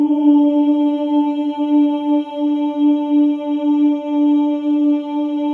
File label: OHS, Symphony of Voices; Spectrasonics